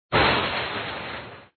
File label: lightning